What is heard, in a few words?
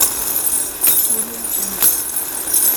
chain dragging footsteps loop